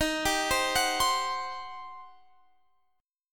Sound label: G Major 7th Suspended 4th Sharp 5th, D sharp